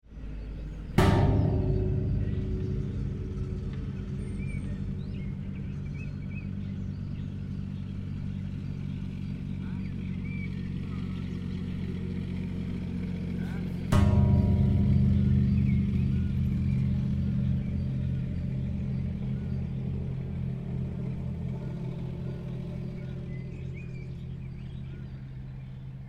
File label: sculpture bonging hare bell bronze; barry flanagan 1998; yorkshire sculpture park bretton